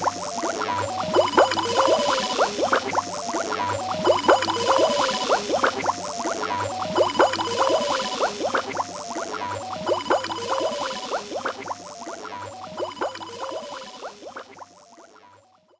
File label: Drumroll; Buzz!: Brain Bender; PSP